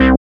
SYN WAH-BLER, dance synth; Sound & Vision - Gigapack I CD 2 (Roland)

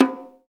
LO BONG, ETHNIC PERC; Roland - LA Composer vol. 1

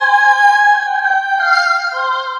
Choral, Vocal; Sonic Foundry (Sony Creative Software); Myths of Technology

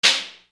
Shaker; Sonic Foundry (Sony Creative Software); Infinite Soul VOL-1

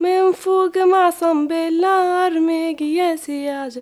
GIRL, GIRLVOICE, Arabian Traditions; USB Soundscan vol.43; AKAI

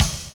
DOUBLE BD; Univers Sons

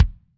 KICK, Drums Percussion

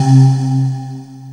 LO CHOIR

